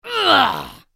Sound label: Mobile game character pain; video game